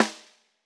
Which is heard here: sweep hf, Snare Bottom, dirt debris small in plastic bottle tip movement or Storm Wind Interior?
Snare Bottom